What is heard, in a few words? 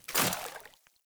Sprout Farmer Water Step, Steps; Characters; Ambient; Sprout Farmers